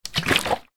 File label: household water spray bottle shake water inside; sound effects one